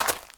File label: gravel walk